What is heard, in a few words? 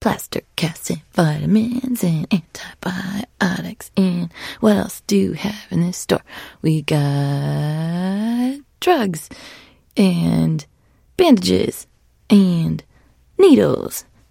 trader jen singing, 七日杀; 商人杰恩